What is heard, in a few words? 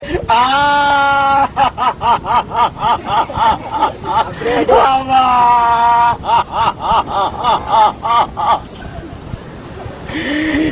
wail